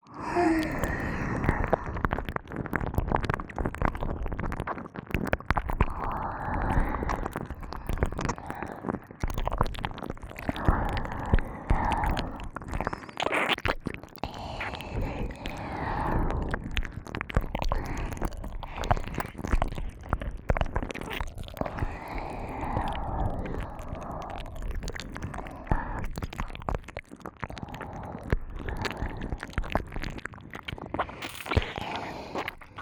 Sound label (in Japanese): ねっとり両耳舐めループ; 耳元ループ; オナサポ